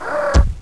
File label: ftstep; thexder